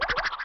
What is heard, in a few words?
eat